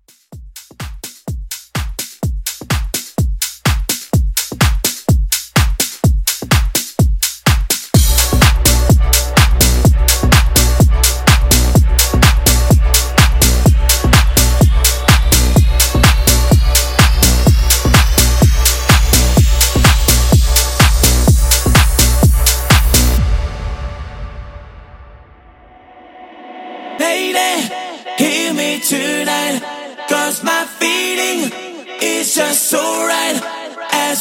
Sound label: French House Remake; Remixfreakz